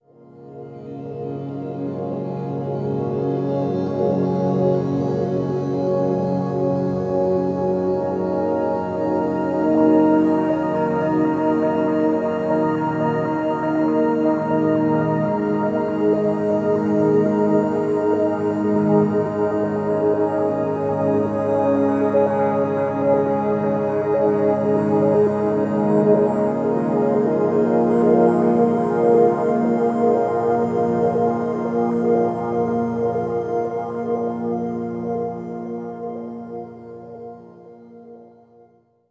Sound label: Low Long Complex, Complex Wave; Sonic Foundry (Sony Creative Software) - James Johnson Spektral Minimalism